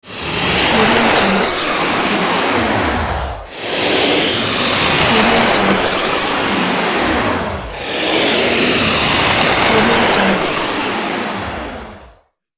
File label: rasping zombies